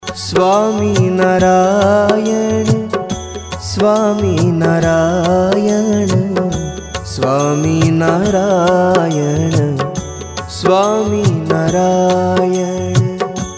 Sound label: peaceful swaminarayan dhun ringtone